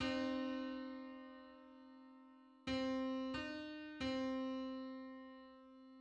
Five hundred ninety fifth harmonic on C

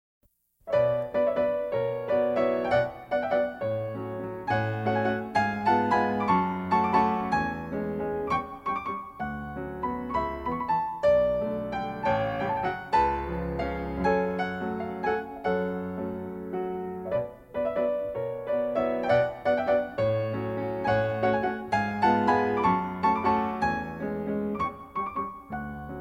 waltz; Brahms

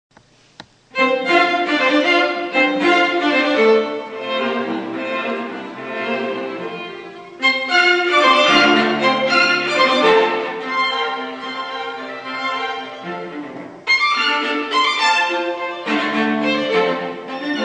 Dvorak American Quartet 3rd Movement

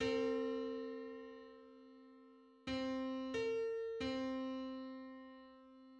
Hundred-eleventh harmonic on C

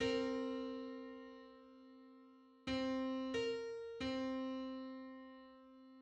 Four hundred fifty first harmonic on C

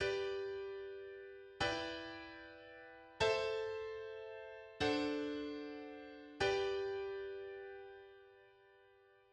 I-vi-ii-V turnaround in G

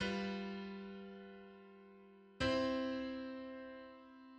Tritone substitutions V7 I

